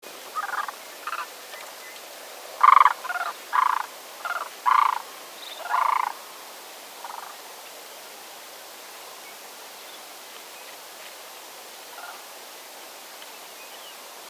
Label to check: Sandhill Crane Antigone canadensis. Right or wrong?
Right